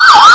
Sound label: aus siren; gmod; lwsirens